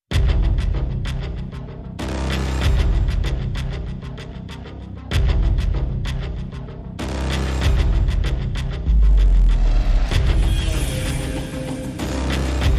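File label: Percussion and Pulses Only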